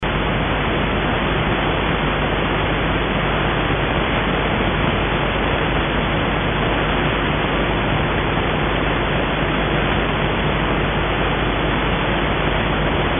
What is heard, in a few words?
dsp filter dx-21 germanium receptor; sprachextraktor